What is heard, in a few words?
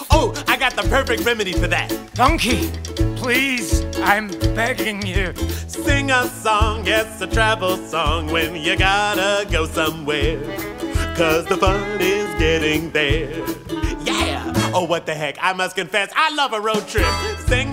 Vocal; Donkey; academy players audition materials